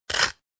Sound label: Pepper Mill